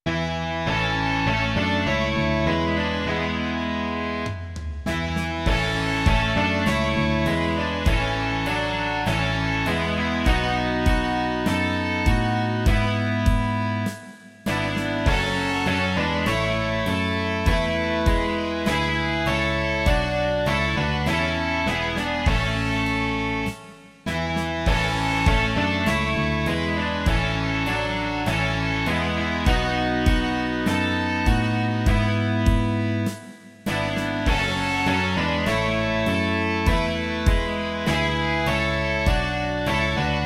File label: rock